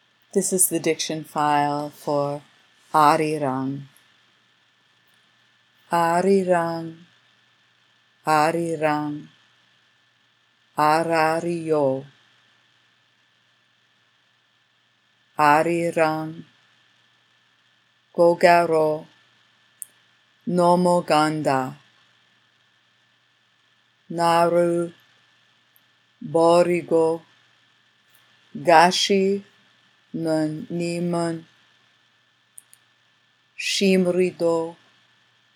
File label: Arirang SATB